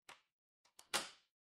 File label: door lock